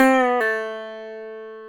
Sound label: GTR, Dobros, National